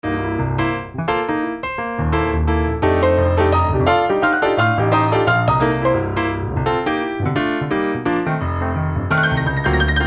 Pianos

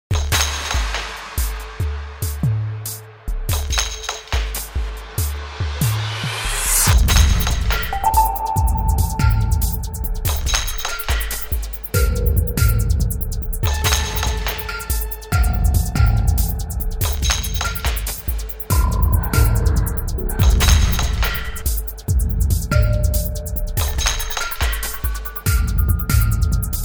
ambient kit

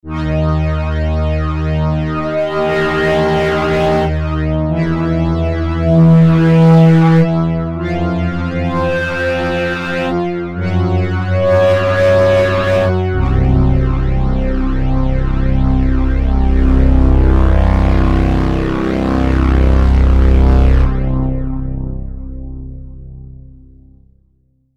orch feedback

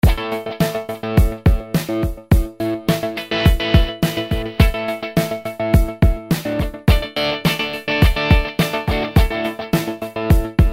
Tremolo sequences

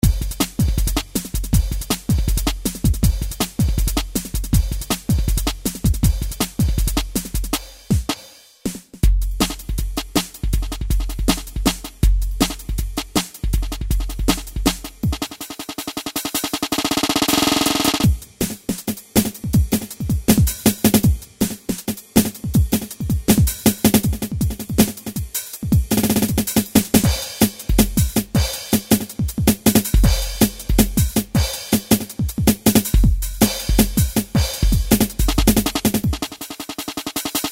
DR-770 Drum N Bass

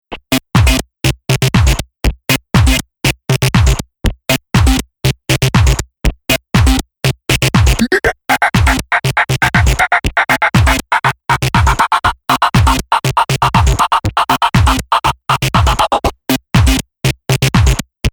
Processed Tech Screamer

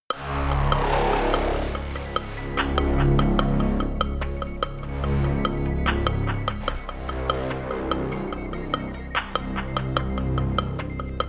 Blofeld notes

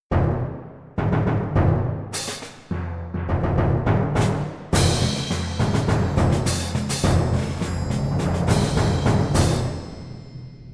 Orchestral Perc

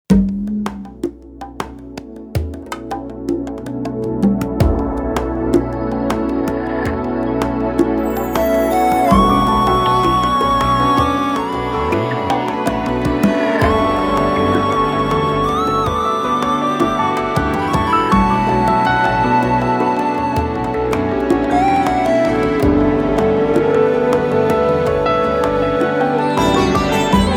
Ethno

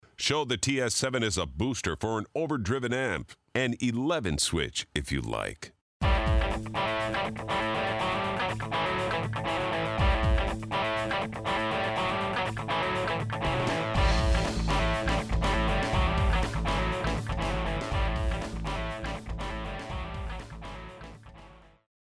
TS7 Booster For Amp

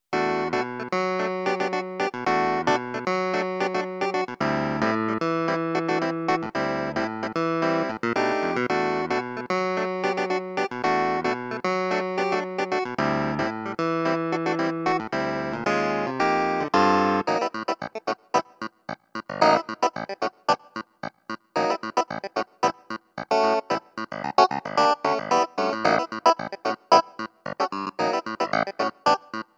clavinet

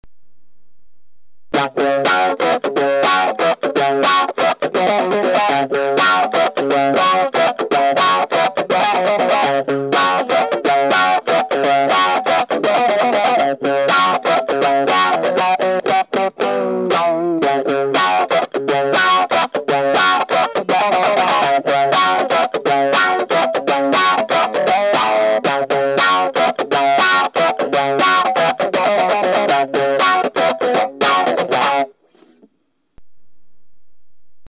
Env Wah Groove Tube Zipper

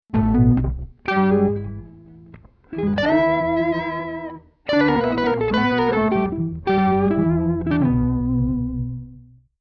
Fat Wide Lo Oct

